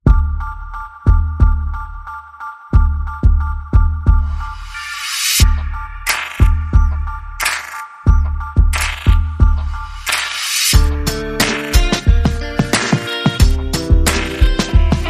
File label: No Vocal